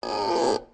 Squeak

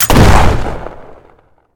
Gun Shotgun Shot; JASEM: Just Another Shooter with Electronic Music

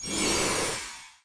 Lightwave

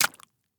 cantaloupe throw splat

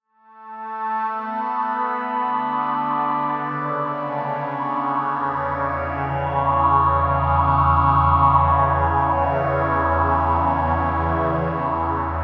bg music loop; lowglow

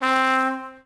Trumpet tone; game rip; lego my style preschool

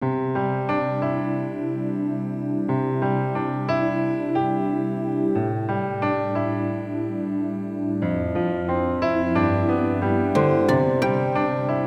The melancholic sound of rain; zansho omimai moushiagemasu. kimi to sugoshita ano hi to ima to

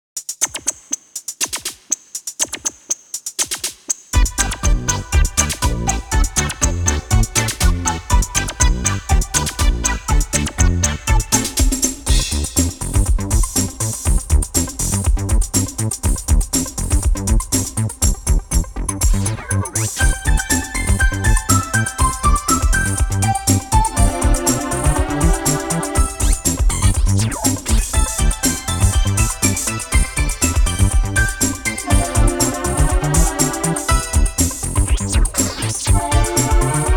Techno; pop n music; dreamcast

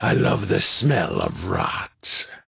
Taunt; Hero Mortemis

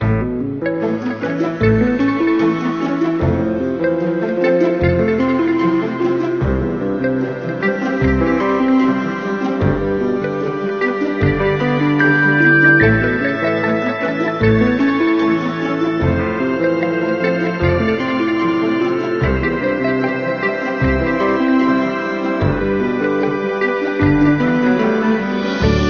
Menu; Mic Menu Wall E; Pixar Pals; Leapster Explorer

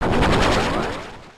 Ancient Power; pokemon reborn e19 2022

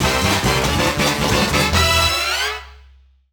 Football - Soccer - Goal (Point Scored); mario sports superstars 2017 3ds gamerip

